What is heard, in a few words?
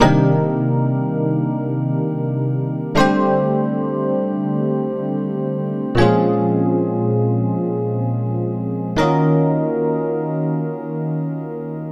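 Electric Piano; Keys; Keyboards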